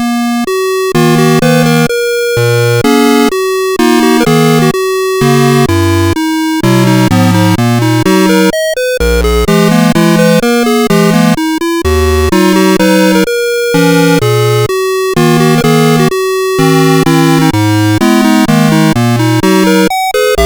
Valse, CSG8580R5

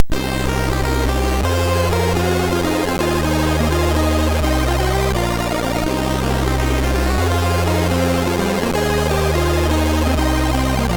F Sharp sid MOS6581R3; Story About F Sharp

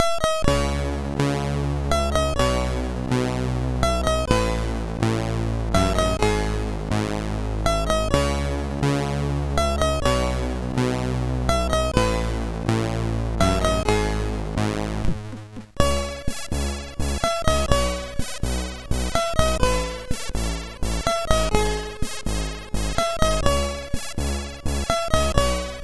Intro Song, sid MOS6581R2

